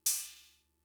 Cymbal, Fricke MFB-522, Drum Machines

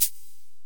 Korg x5, Drums

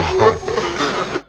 fx vox; E Lab; XTc Files of House

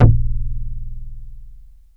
Bass D#0, Prophet; ShortBass2; Oldies

